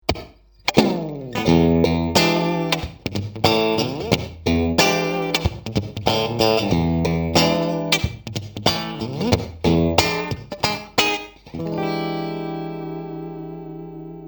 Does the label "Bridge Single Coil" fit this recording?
Yes